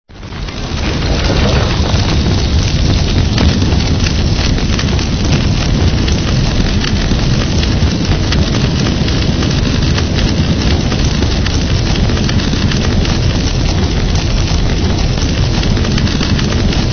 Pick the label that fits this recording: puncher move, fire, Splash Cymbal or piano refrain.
fire